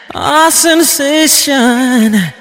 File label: spy laugh short; spy jackson